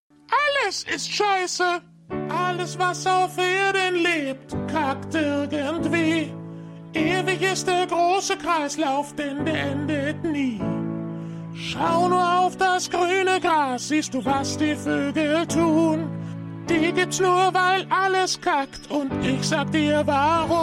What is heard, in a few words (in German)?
Gesang